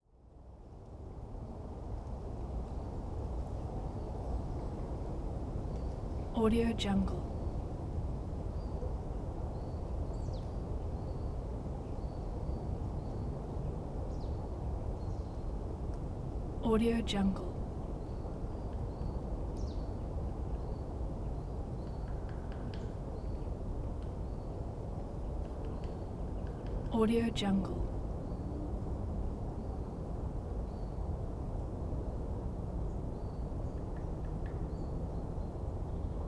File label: autumn distant city ambience with birds and woodpecker